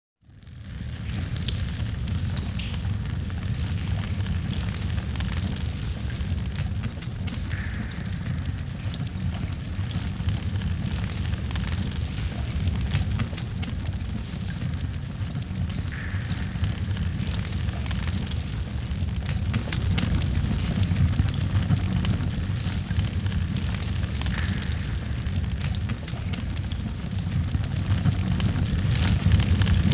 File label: Temple Stage (AMBIENT); King of Fighters XIII; PS3 version